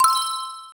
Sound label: coin; watermelon